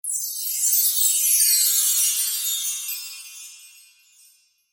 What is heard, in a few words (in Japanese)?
喜びが溢れるような音